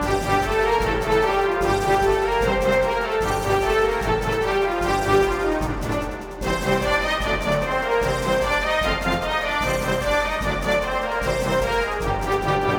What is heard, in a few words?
music boss fast; TyTheTasmanianTiger3; XBOX